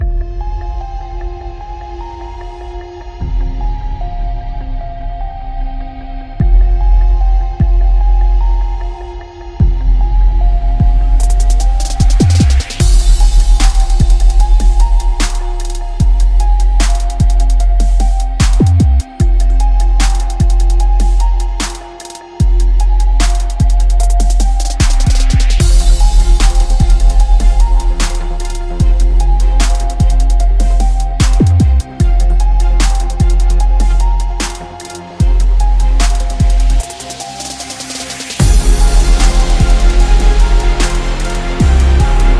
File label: Cinematic Trap